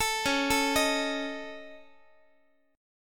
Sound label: Db Suspended 2nd Sharp 5th Db Guitar; arpeggiated; Dbsus2#5; JGuitar